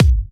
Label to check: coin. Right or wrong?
Wrong